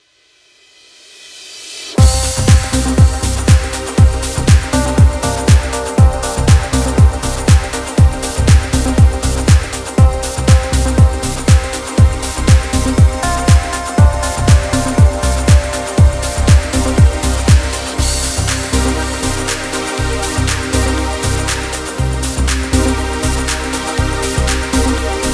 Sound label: Audio Mix - 120 BPM C Major, Chill Trance; Martech Chill Trance Construction Kits Vol 1